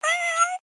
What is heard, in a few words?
Meow